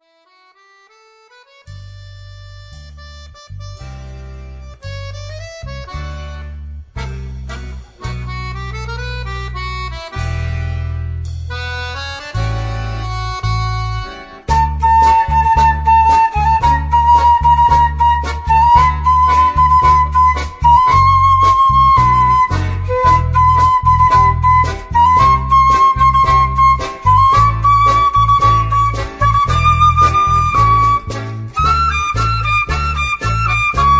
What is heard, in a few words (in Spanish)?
tango uno